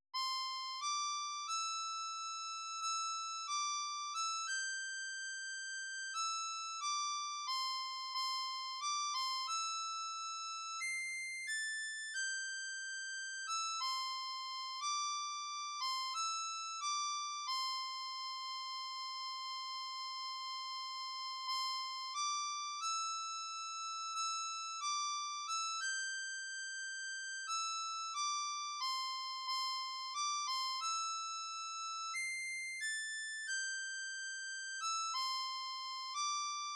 Hard Times, harmonica; Do; Stephen Foster

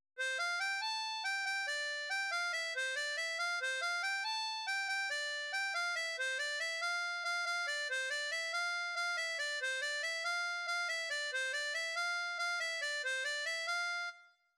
Il court il court le furet, harmonica; Do

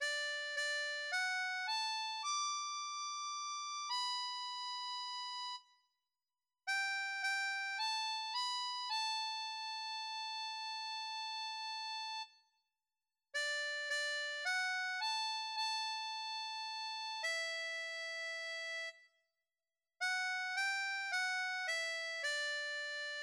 On top of Old Smokey, harmonica; Diatonique

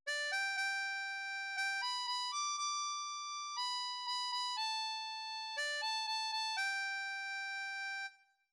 Chevaliers de la table ronde, harmonica; Sol

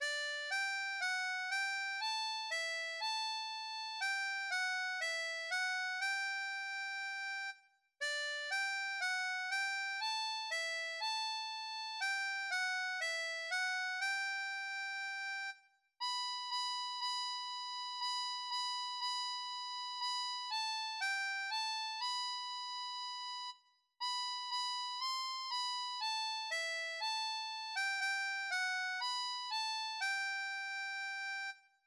Aura Lee, harmonica

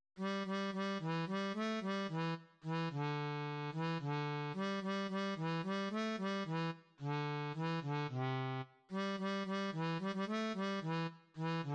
Campton Races, harmonica; Do; Stephen Foster

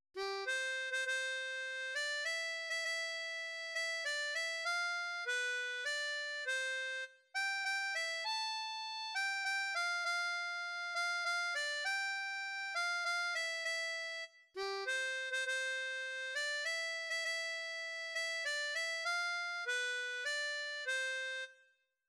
Mon beau sapin, harmonica; Do

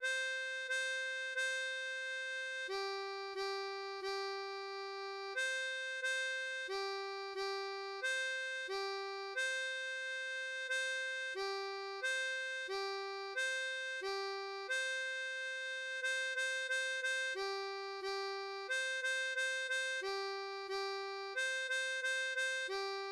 Rythmique, harmonica; Do

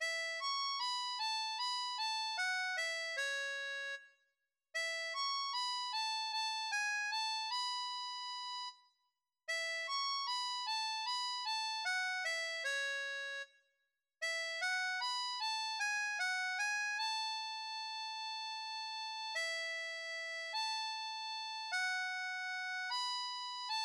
My Bonnie lies over the ocean, harmonica

